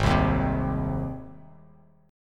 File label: Ab Major Ab down Bass Standard; strummed; JGuitar